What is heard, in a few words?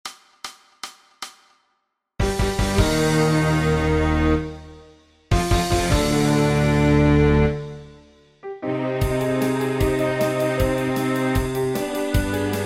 Symphony No5 modern remix; Ludwig van Beethoven